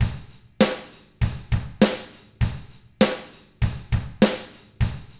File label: ROCK, Drums; ILIO; Double Platinum Drums 1